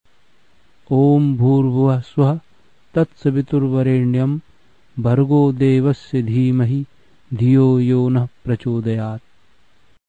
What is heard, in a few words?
Maha Gayatri Mantra